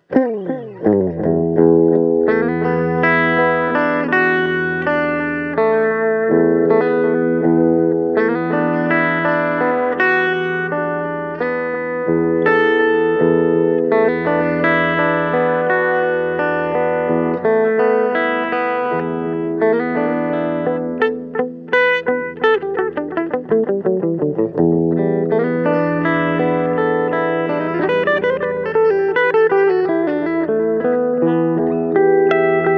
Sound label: Clean Delay